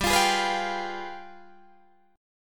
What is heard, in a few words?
G 7th Flat 9th, Guitar, Open D